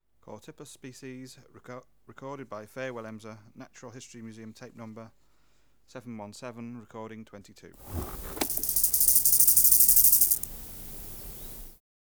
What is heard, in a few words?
Chorthippus